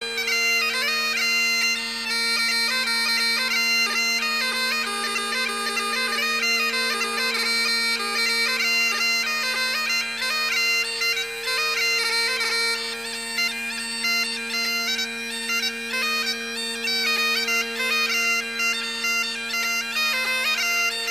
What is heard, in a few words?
Bagpipes Warpipes